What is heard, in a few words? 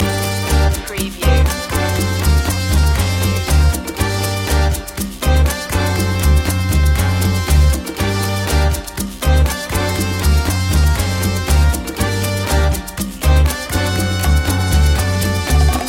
cuban heat